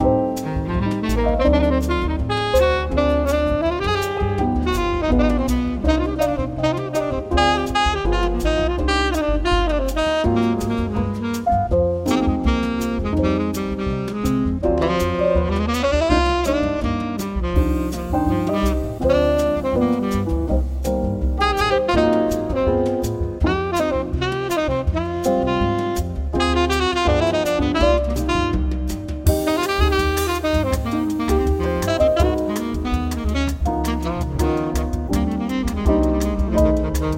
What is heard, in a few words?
Brazil Bossa